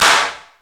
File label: AMB CLAP, HAND CLAP